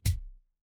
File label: feedback confirm